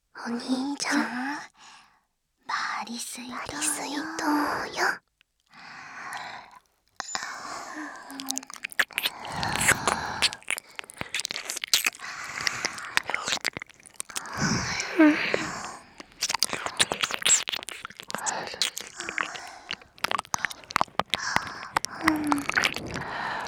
W耳舐め ロング 左右反転

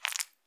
膣内射精後, 引き抜かれた瞬間; ■挿入or引き抜く

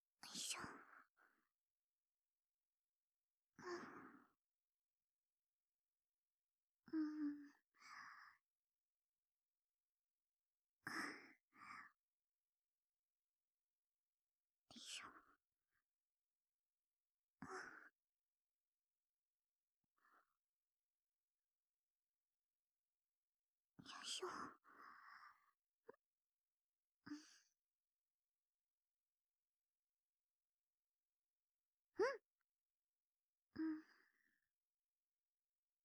右耳用マッサージ・耳かき息遣い; 見習い天使が頑張るあなたに癒しをお届け; かしまし☆とらいふる

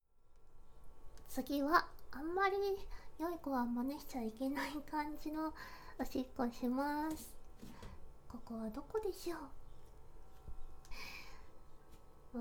シンクにおしっこする; 背徳感

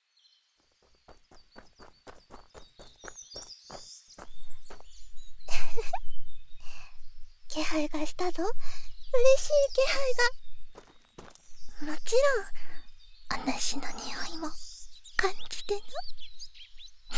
耳かき, ASMR

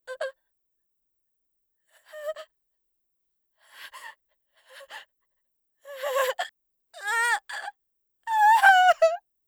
絶望

